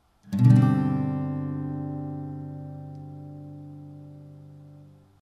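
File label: Gsus4, Acoustic Guitar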